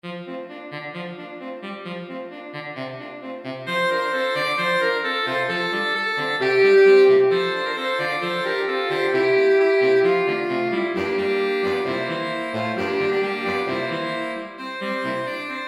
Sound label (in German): saxophonquintett